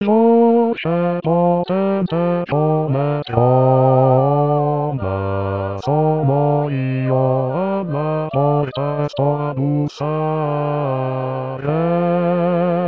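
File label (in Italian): Inno a Cristo Signore Dei Millenni Bassi; anno liturgico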